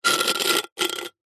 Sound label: foley rock concrete knife blade scrape scratch; zapsplat